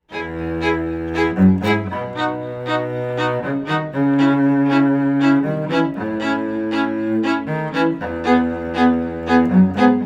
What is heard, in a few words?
trio